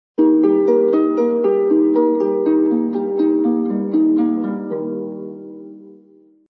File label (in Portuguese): harpa